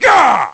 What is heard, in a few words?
ugh; megumi